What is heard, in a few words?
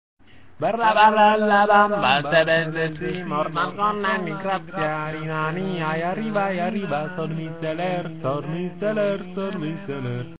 Mexican ringtone